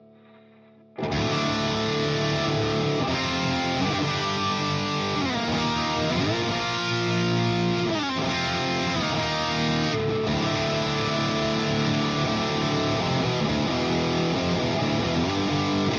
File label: Amp sim